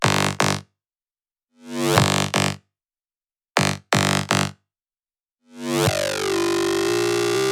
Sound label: high bass dry; warm; kern audio